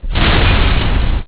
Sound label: Big Impact; GLScene; AirBlast